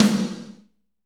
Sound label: SNR, Snares, Roland; Northstar - Drumscapes Roland